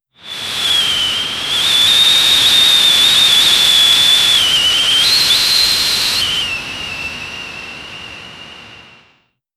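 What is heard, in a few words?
high pitched whistling wind, storm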